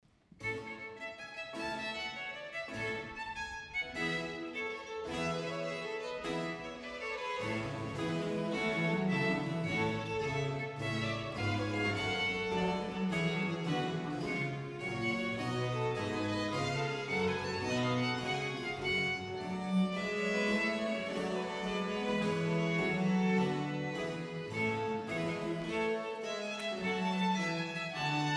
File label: Sonata in Bb Major Allegro; Corelli; Op 1 5